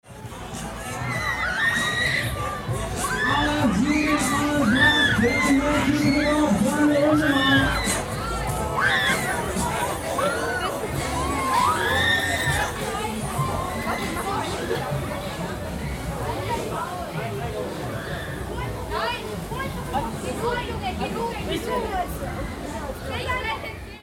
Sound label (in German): Rummel Menschen Schreie Ansage